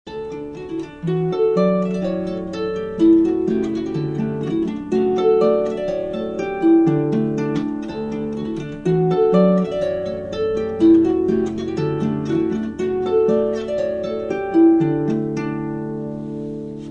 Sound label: polka; kerry